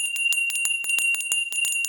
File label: glass bell; rossi